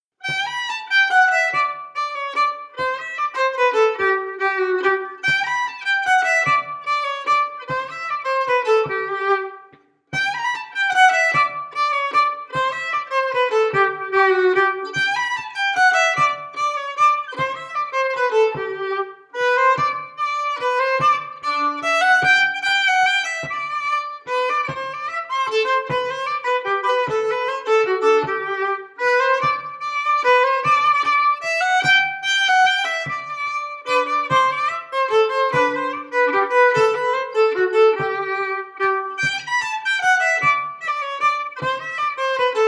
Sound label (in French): Valse